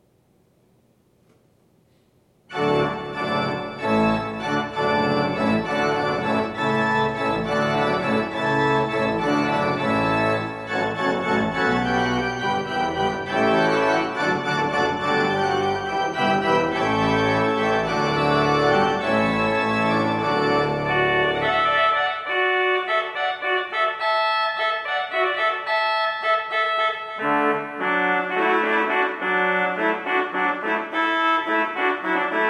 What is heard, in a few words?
Alla Hornpipe; Water Music; G. F. Handel